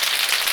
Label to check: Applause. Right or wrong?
Right